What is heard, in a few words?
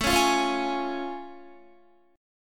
A Augmented Major 7th A Guitar; strummed